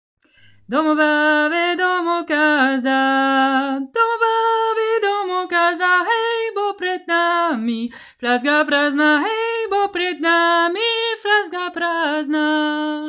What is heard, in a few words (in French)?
baby voix aigue